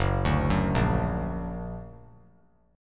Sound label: F sharp Augmented; arpeggiated; 3C,3F,3A,3D; 6,9,9,8; JGuitar